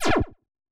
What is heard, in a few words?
laser fire